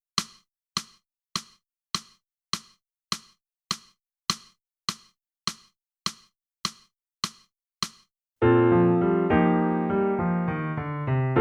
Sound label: clarinet